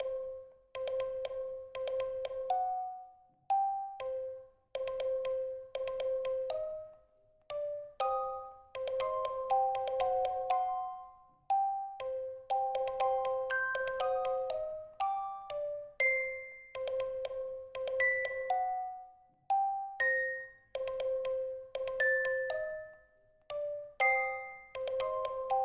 Airship Night; SMM2; SMW

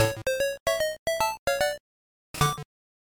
Course Clear; SML2

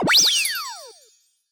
Ring Coin Go Through; SM3DL

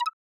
Timer Countdown Result End; SM3DL

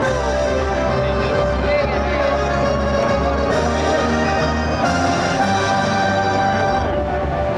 World Clear, a short musical fanfare; SMG; Unused

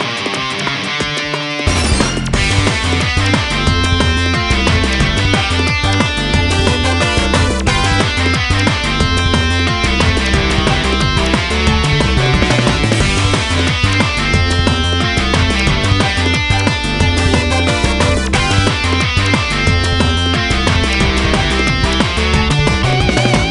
Corkscrew Climb; MPSR; The theme for Corkscrew Climb and Leafboard Hoard from ''Mario Party: Star Rush''.

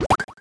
Item Remove To Goal

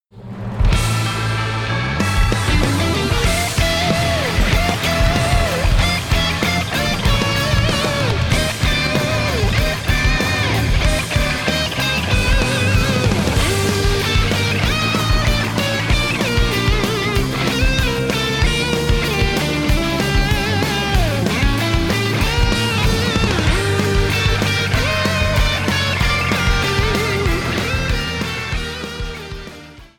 Castle BGM Arrangement; NSMBW

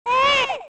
Baby Luigi cry; SMA3

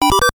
Disk Read; FDS

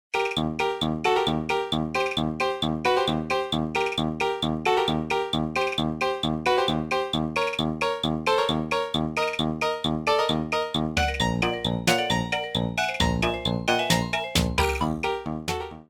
Bob-omb Reverse; NSMB

